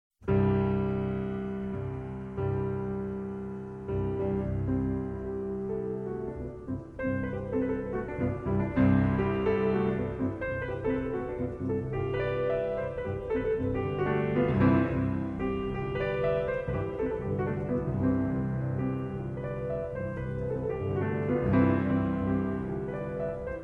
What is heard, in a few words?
Mazurka In C Major Op. 56 No. 2, PIANO; Chopin